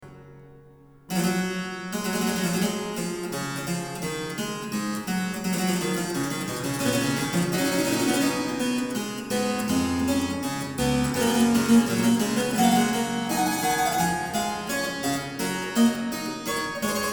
Fughetta, Harpsichord; Bach, J S; Goldberg Variations; BWV 988

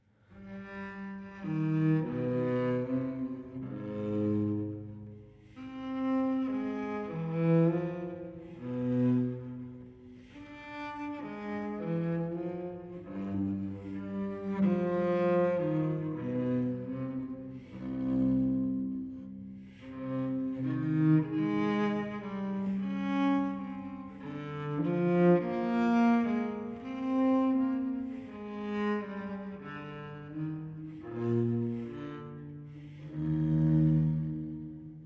Cello Suite No. 5 in C minor, Sarabande; BWV1011; Johann Sebastian Bach

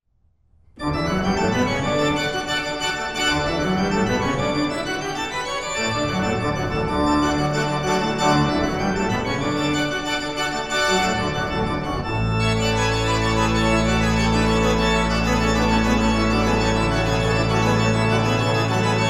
Prelude and Fugue in D major; Bach

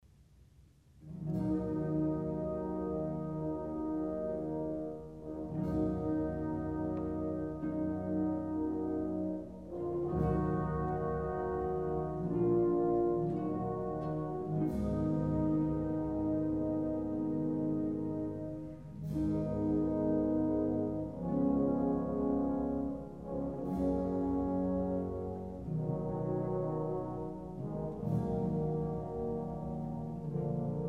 Scottish Fantasy for violin & orc; Kyung-Wha Chung; Bruch

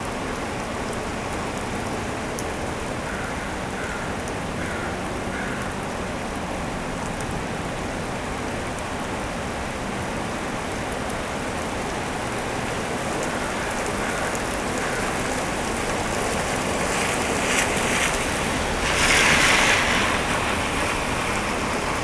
Field Recordings Oregon Rain Rain, Cars & Crows; Omni Gardens

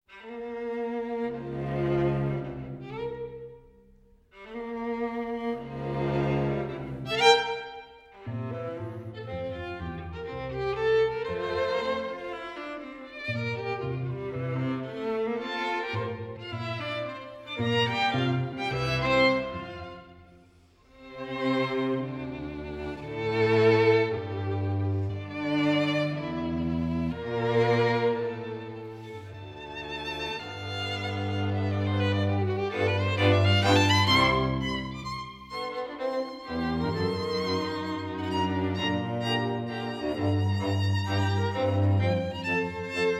String Quartet in F Major, Allegretto; String Quartet No. 16; Op. 135; Ludwig van Beethoven; Beethoven - String Quartets Nos. 1-16

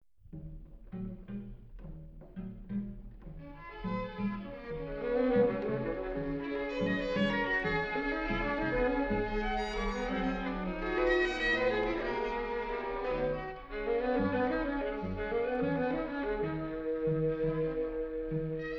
Quartet B Flat Major, String Quartets; Béla Bartók

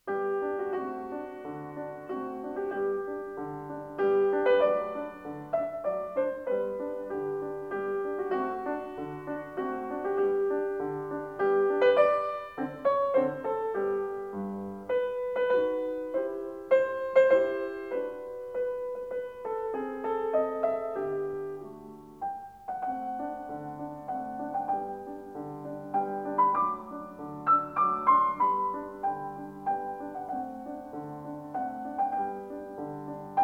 Piano Sonata No. 20 in G major; Wilhelm Kempff; Beethoven; Piano Sonatas

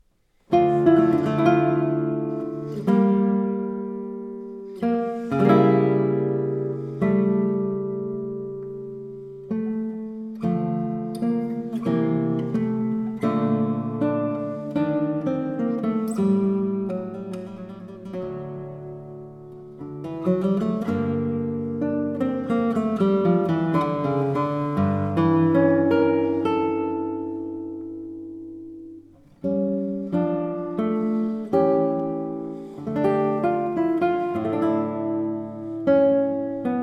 Violin Partita No 2 in D Minor, guitar, Sarabanda; Bach Transcriptions and Arrangements for Guitar

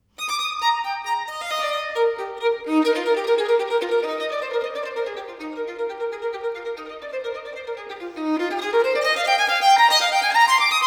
Violin Partita No. 3 in E Major, Preludio; BWV 1006; Bach

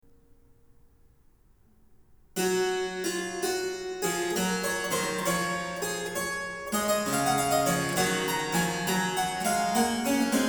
Canone all'Ottava, Harpsichord; Bach, J S; Goldberg Variations; BWV 988

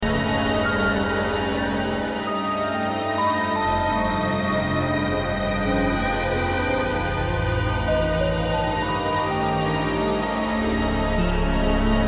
Coda Queer Space Harmonic Minor; Cheltenham

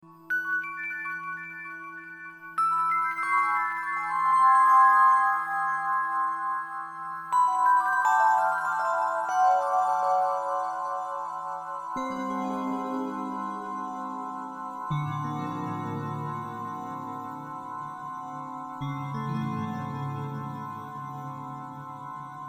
Final Bell Threshold; Worcester

